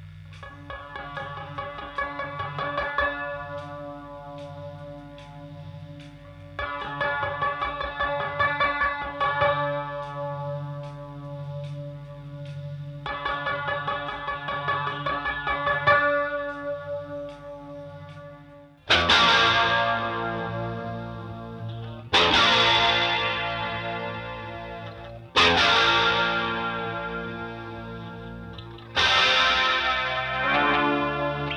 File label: Guitar